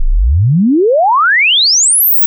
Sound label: Sweep